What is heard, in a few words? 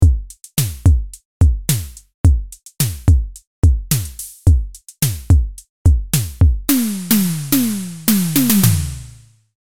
Drum Init